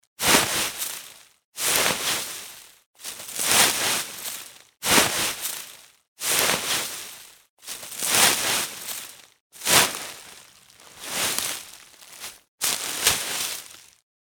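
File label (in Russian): грабли сухие листья в кучу гребут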